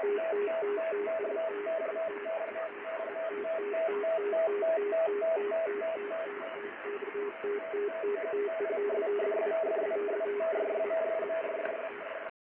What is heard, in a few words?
40xx usb